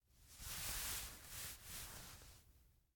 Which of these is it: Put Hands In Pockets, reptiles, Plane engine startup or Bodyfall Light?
Put Hands In Pockets